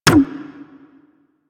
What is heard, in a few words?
Air Pistol Projectile Bounce; Warframe; Corpus